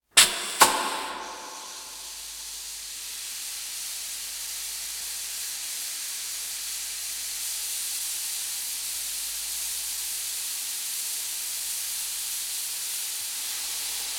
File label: Air Release; Alert Sounds; sound play workshop sounds